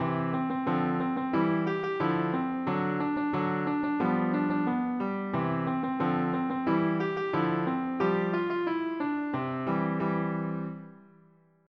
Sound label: piano fast; fast tempo; PlayScore version